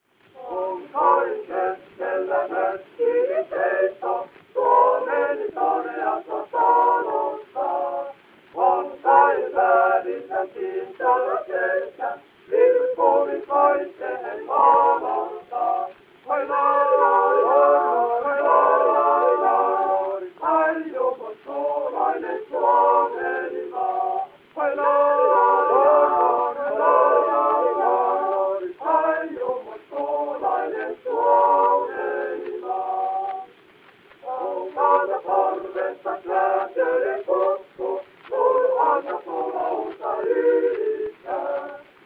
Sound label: Gramophone